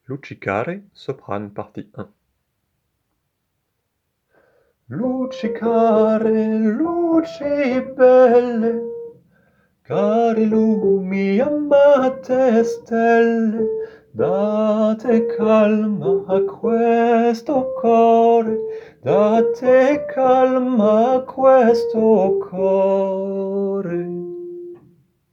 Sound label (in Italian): Luci care soprane partie 1; W.A. Mozart; Luci care luci belle-1°partie